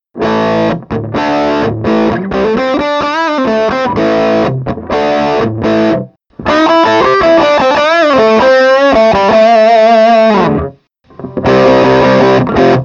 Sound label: Overdrive